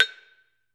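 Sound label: HI BAR F#, Metalophones, Pitched Percussion; E-MU Producer Series Vol. 7 – Old World Instruments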